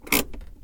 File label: emergency brake; car